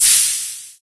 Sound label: doors; ulife